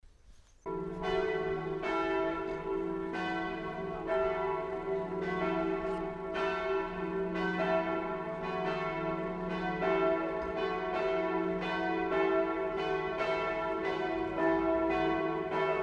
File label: Bells at St Brice en Cogles; Holiday 2001 in France